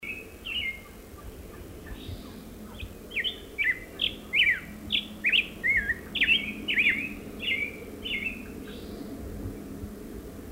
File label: American Robin + Grosbeak